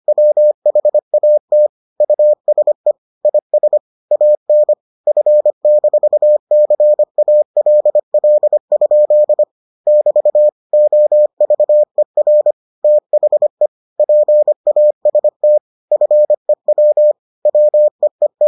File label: morse